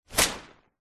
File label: foley tree leaves branch whoosh impact designed